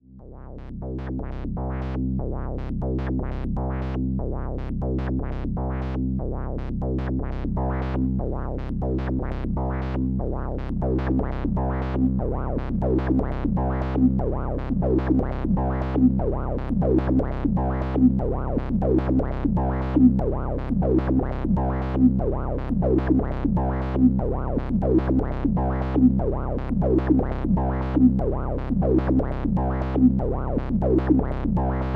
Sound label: Morpheus Analog; Rossum Electro Music